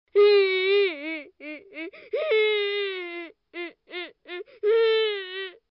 Tieng tre con buon va khoc loc

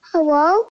nhac chuong baby hello

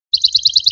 nhạc chuông tin nhắn tiếng chim hót

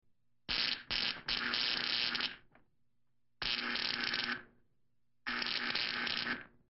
Am thanh tia lua dien

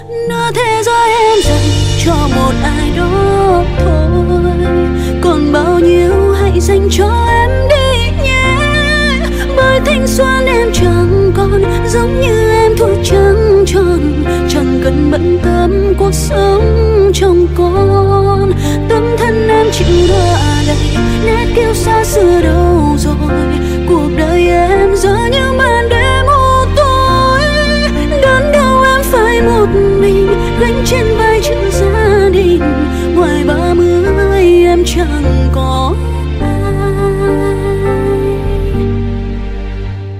nhac chuong giong nu

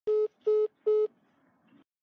am thanh ket thuc cuoc goi khong nghe may

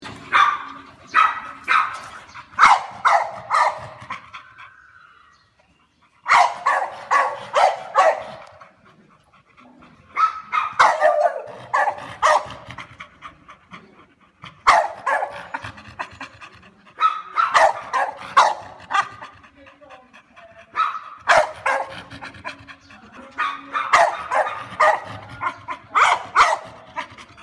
tieng cho bull sua

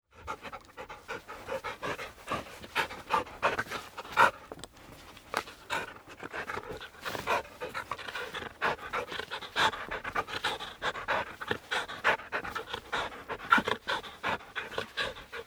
Tieng con cho dang chay va tho hon hen

